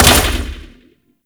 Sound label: Grenade Bounce, Weapons; Warframe; Corpus